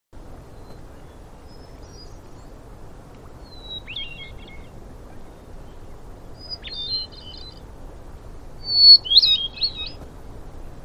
Varied Thrush Song With Humpback Whale in Background; alaska acoustic highlights; BIRDS